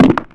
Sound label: step, cow; Alien Arena